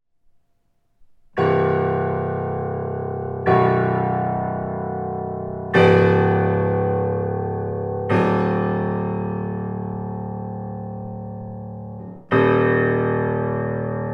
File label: bass buzz; Cracked soundboard in a Yamaha